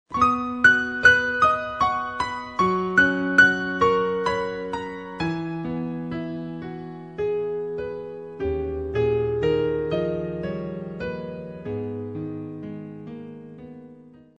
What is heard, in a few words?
sms piano ringtone